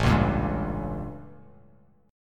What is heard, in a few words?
A 7th Flat 9th A, Bass Standard